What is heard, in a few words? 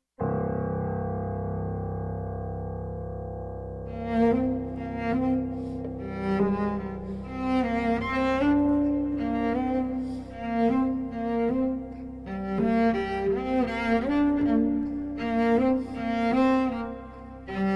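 Dancing Rain, Thunder And Lightning, Improvisations For Cello & Piano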